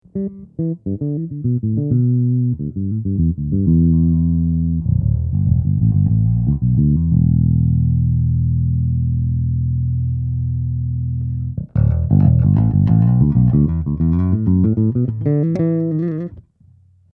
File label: Seriell FINGER, bass